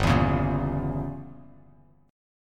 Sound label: G Diminished 7th down Bass Standard; strummed; JGuitar